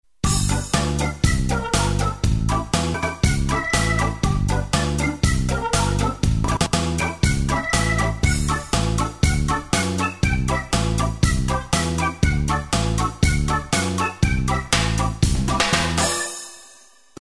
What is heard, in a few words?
Reggae